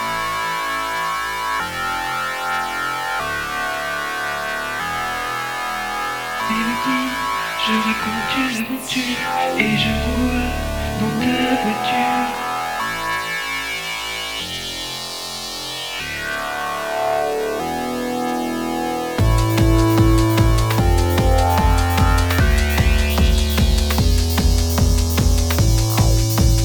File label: Orchestre